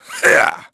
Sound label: effort; HADEAN; Pulse Racer